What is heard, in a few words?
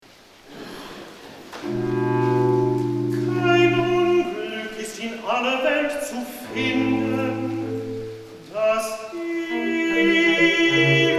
Recitativo T; BWV020 O Ewigkeit, du Donnerwort